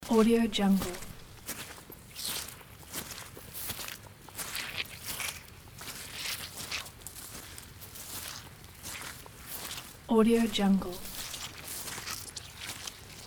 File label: steps in muddy ground; ed soundmaker